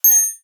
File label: Single UI Beep